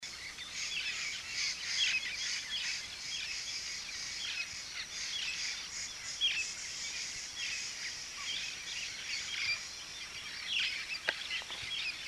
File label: Red lored Amazon Amazona autumnalis lilacina